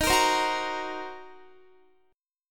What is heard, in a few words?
D sharp Minor 6th D sharp, down, Guitar; D#m6; strummed; 4E,4A,5D,5G,5C,6F; chord shape